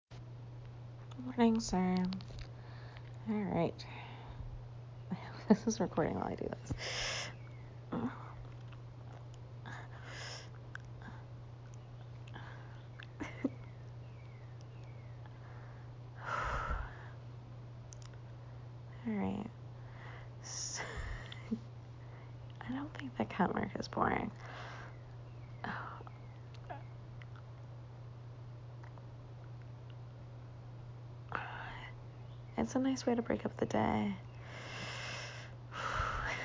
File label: orgasms; Rainy day